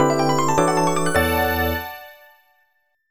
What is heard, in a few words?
Jig; eigo de tabisuru little charo